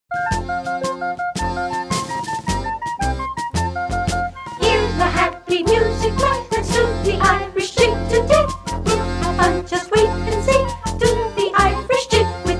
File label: Irish Jig; KIMBO; folk dance fun